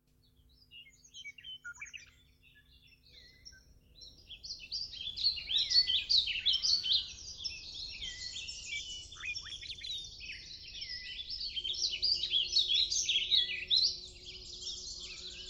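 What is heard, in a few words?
Birds and Bels without carillon, Klaipeda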